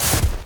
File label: snow place; everness